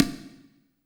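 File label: ambience industrial, ethnic percussion; Drums In Your FACE!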